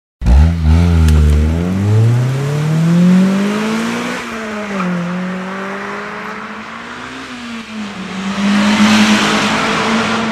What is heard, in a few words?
Honda Civic Type R